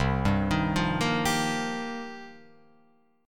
C-Minor 11th-C-0,2,3,0,1,2, down, Guitar, Open F, Cm11 chord; arpeggiated; JGuitar